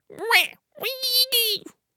Ice Mage Attack Vocals

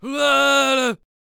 Brawler Attack Vocals

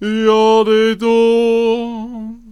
Healer Attack Vocals

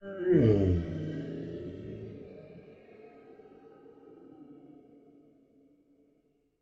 Ice Giant Death Vocals

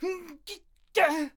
Ice Archer Attack Vocals

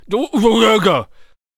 Gunslinger Attack Vocals

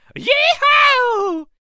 Lasso Attack Vocals

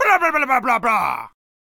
Knight Attack Vocals

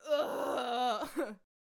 Peasant Death Vocals

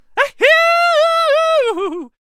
Lasso Attack Vocals

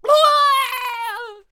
Blunderbuss Death Vocals

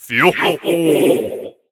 Candlehead Old Attack Vocals

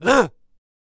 Stoner Attack Vocals

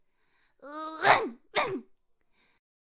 Swordcaster Attack Vocals

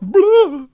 Healer Attack Vocals

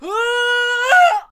Musketeer Attack Vocals

